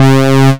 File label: Synths Note C